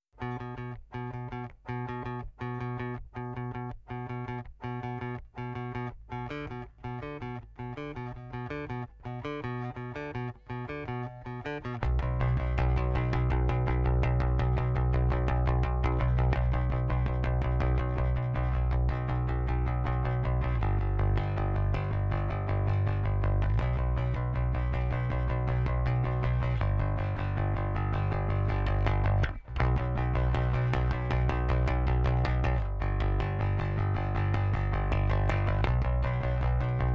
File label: Seven Bas DI; Seven creatures down